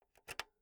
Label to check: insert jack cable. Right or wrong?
Right